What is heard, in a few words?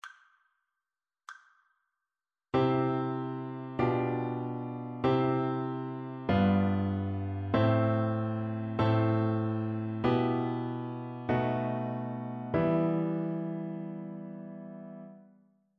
harmonic dictation